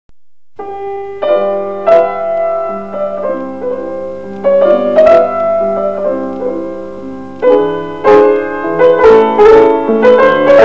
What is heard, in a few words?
Chopin Mazurka